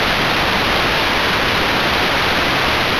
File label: White noise